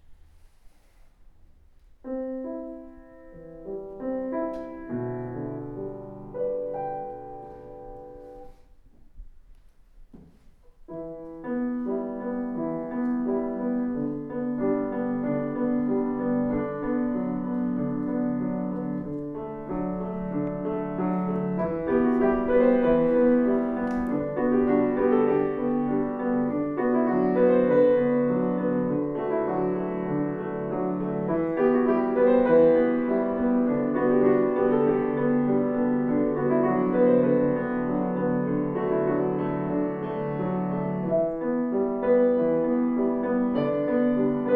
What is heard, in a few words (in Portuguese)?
Piano